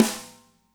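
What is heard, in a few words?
snare left; Spectrasonics; Burning Grooves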